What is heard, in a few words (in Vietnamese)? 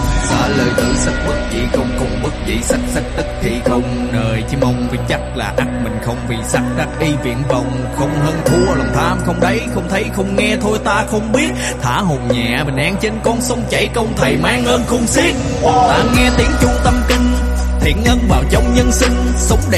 Nhac Chuong Tieng Chuong Bat Nha